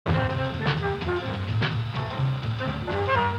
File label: jingle bells; bird